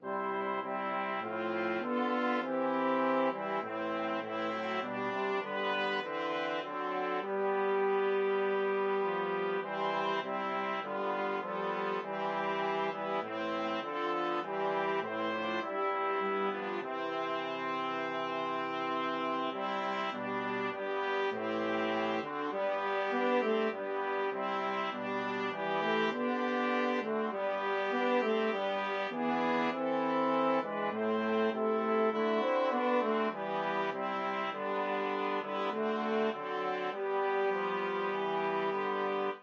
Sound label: BRASS, brass quartet